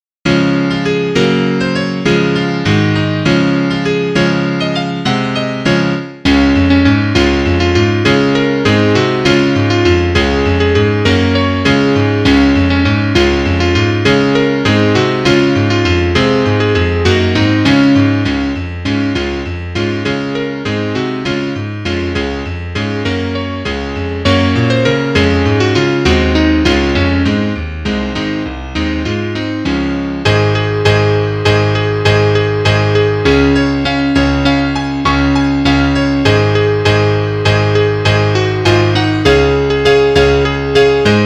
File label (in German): Klavier Solo, trompete; Zehnerpack